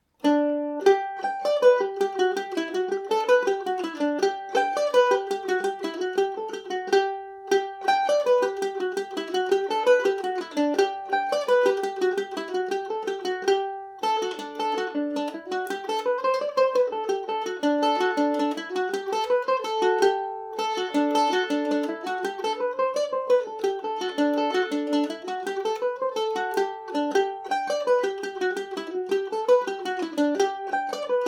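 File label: quickstep, G major, irish mandolin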